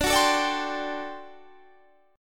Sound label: D 11th D, down, Guitar, Standard; D11 strummed; JGuitar